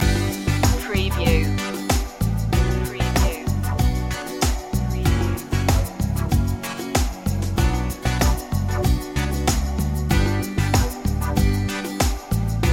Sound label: love rhythm loop; good vibes